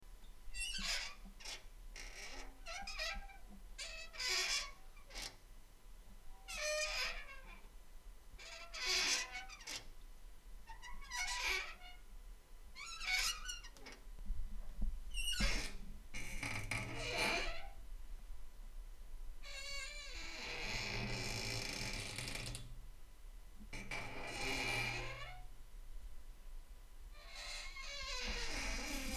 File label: metal creaks; EvilCocoGame1